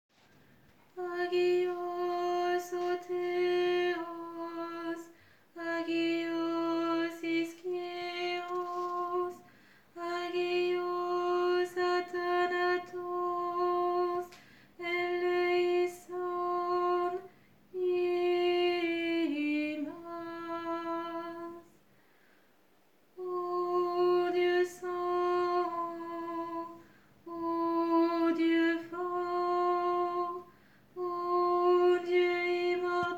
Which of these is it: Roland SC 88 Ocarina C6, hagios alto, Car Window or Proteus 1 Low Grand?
hagios alto